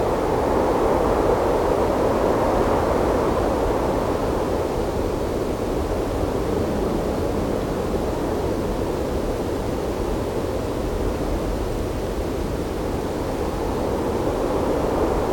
Light wind; Inquisitor 2

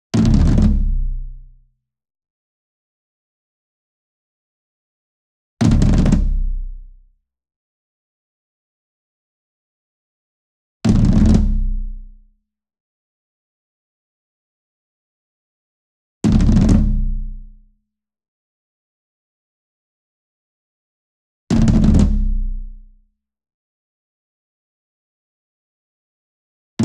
ENSEMBLE DRUM Concert Toms Roll Near; boom library; Cinematic Strikes Construction Kit

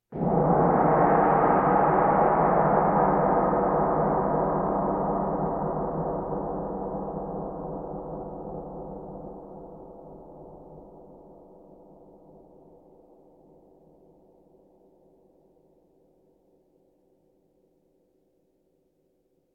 Percussion Bongos Tympani rolls Gongs Drums

